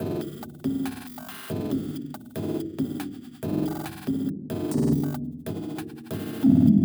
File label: Loop - 140 bpm; 99Sounds; Mangling Audio; Mangled Software; Convolution Abuse